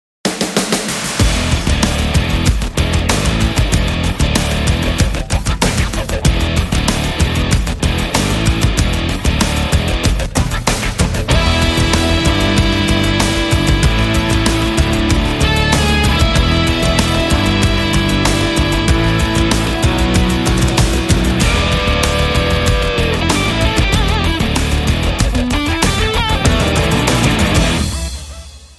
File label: CHEEKY WAH WAH